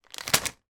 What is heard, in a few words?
sweets bag plastic drop on table; foley